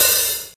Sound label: Small Reverb Open Hi Hat Sound G Key, Royality free open hi hat one shot tuned to the G; open hat samples